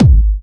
Trance Kicks, Bassdrums

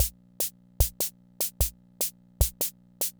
Kent K-220 Rhythm System Loop, drum machine; Kent K-220 Rhythm System Sample Pack; 300 Drum Machines

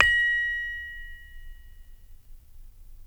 SARON A#4; Spectrasonics; Heart of Asia

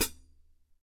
HH Pedal; EMU PX-7

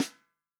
snare drum, FUNK SNARE CHROMATIC; REAL DRUMS

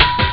hihat; thepower; Amiga Soundtracker Sample Packs

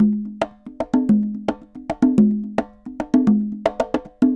Congas Murga 110 bpm, Drums & Percussion; Best Service; Festa Latina VOL-1

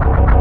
Korg, FX

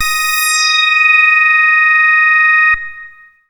MASS LEAD, Synth

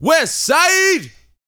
VOCAL F; ILIO

